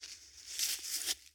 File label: Foot Grass Walk Stop; Lucius III; pc linux